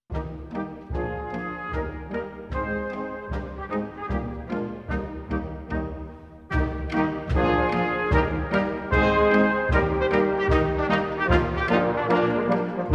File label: Schottische Quadrille